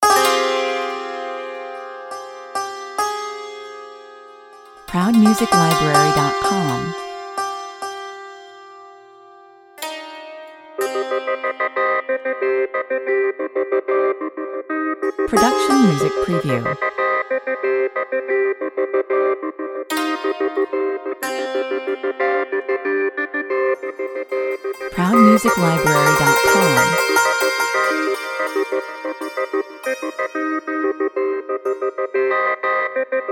dulcimer

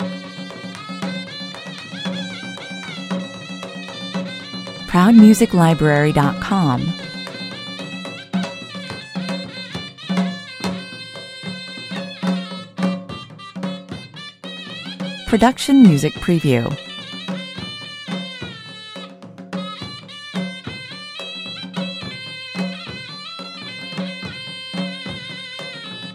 indian village wedding music loop

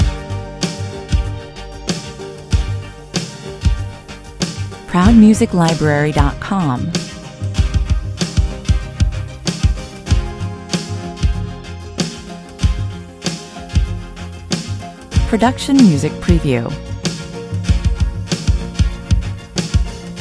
dance rhythm level loop

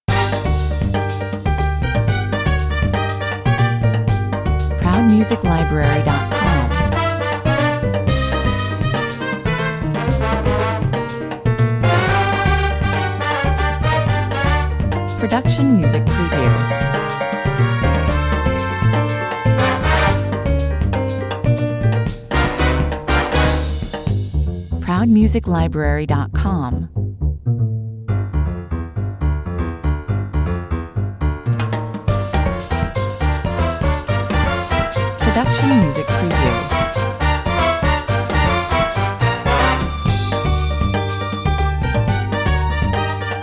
salsa 120 bpm

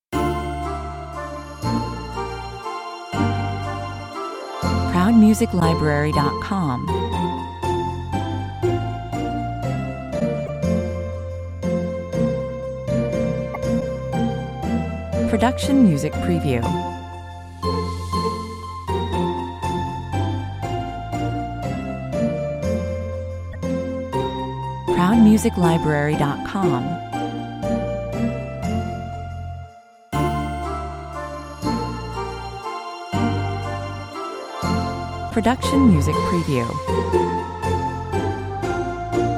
no drum kit; Away In A Manger

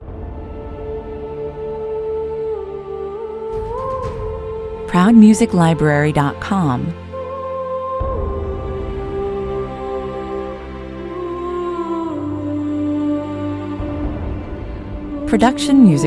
intro with solo voice

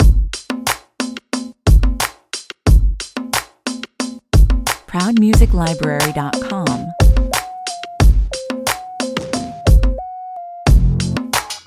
bass, 90 bpm

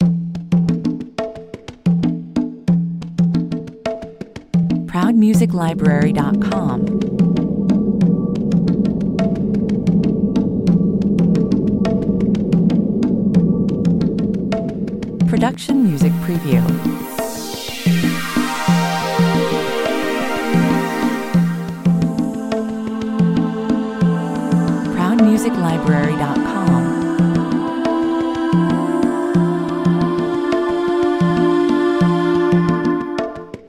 afrikaans 90 bpm

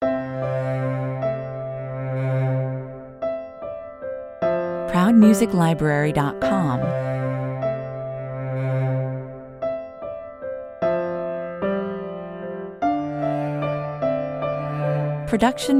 piano and cello 150 bpm

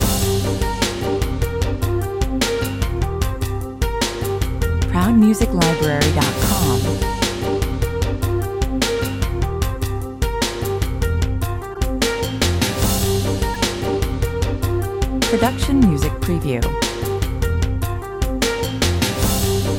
groove bed

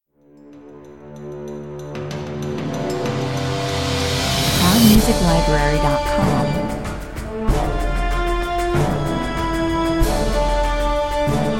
march, 95 bpm

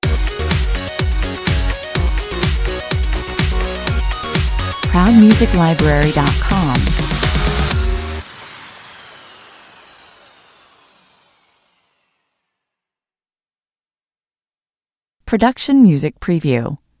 pop stinger

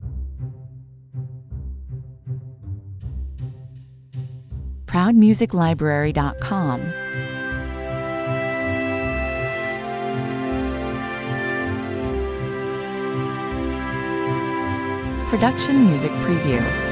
movie trailer positive, 80 bpm

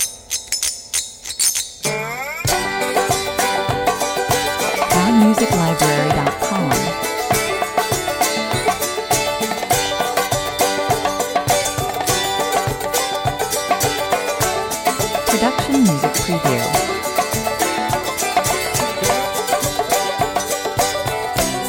thumbless 103 bpm

